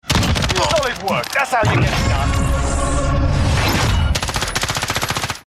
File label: warzone win audio